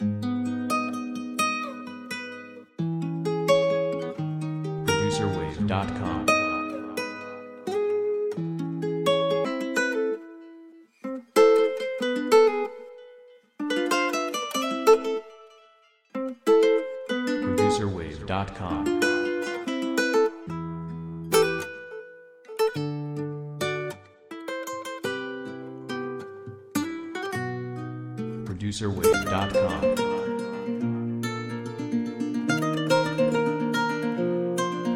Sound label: Spanish Guitars; SephGotTheWaves